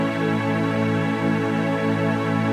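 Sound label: la major; playback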